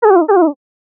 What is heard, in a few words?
crow call soft